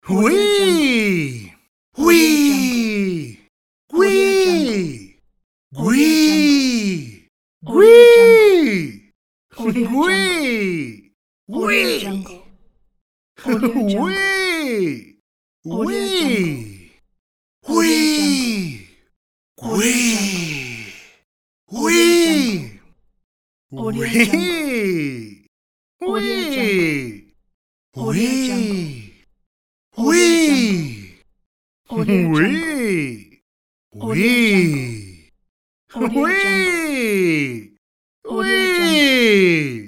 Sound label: man wee shout pack